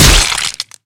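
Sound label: short metallic clang while reloading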